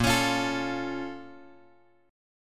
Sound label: A sharp Minor Major 7th; A#mM7 Chord {6 X X 6 6 5} strummed